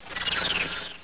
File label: PIP STEAM